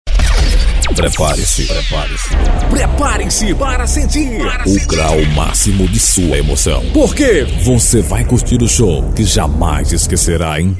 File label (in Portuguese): forro swing abertura